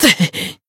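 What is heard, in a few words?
Voice damaged Japanese; dunarith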